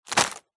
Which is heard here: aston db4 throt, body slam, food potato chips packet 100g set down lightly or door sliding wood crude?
food potato chips packet 100g set down lightly